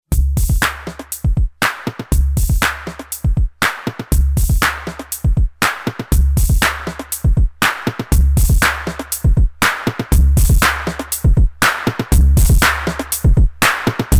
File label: Drum Drive; IKM Uno